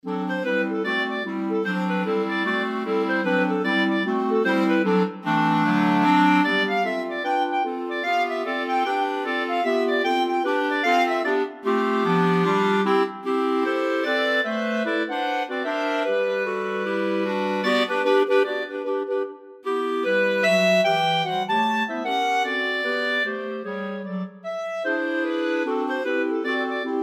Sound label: clarinets